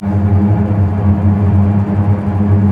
STR SEC; Roland - LA Composer vol. 1

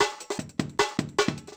LITE LOOP; Yamaha DTXpress-II

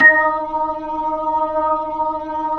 B-3, Jazz; Roland LCDP10 Keys of the 60s and 70s 2

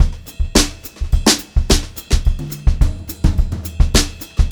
HOTROD VARI, Brushed Drum Loops; USB Soundscan vol.39; AKAI; 90 s Sample CDs

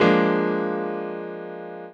Piano OS; Rondo Brothers Trip-Hop Loops & Samples

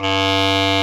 WND, CB Clarinet; Roland LCDP04 Orchestral Winds; Lo Clarinets

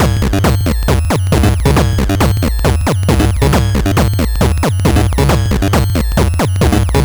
LSDJ Wonkin' Loop 136BpM, Nintendo Gameboy Loops; maurice.rebell Loops

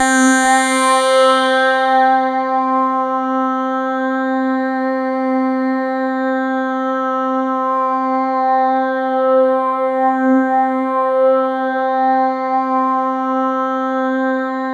C4, Roland JV 2080 TECHNO PAD

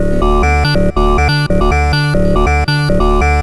Trance Bassloop 140bpm, Bass Arps

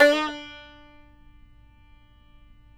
tar; Roland - LA Composer vol. 3

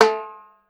TIMBALE; Houseworx; Drums; Perc

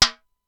Simmons SDS-9 drum machine

